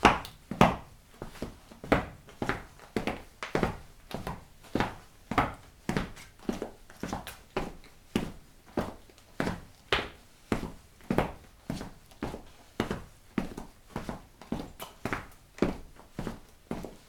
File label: Footsteps On Tiles 3m Away Loudish Steps